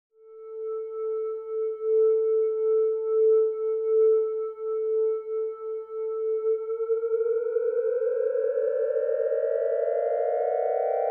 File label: heterodyne brainwash